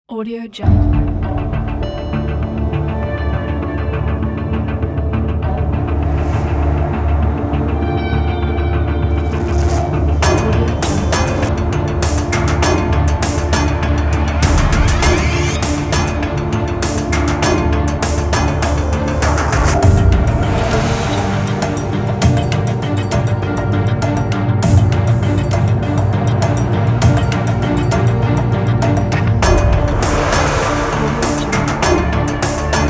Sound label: disturbing dive